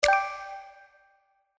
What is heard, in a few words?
btn Standard info; slots; Pirate Quest